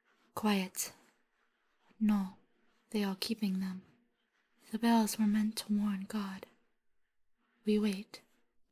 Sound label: vocals